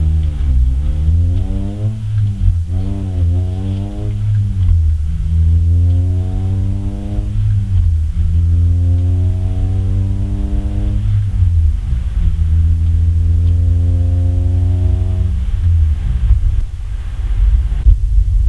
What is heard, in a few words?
silenced inside daily to 3k, Exhaust